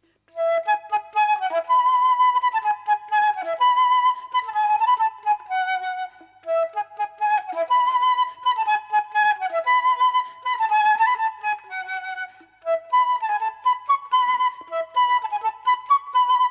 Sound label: Solo Flute Spring Vivaldi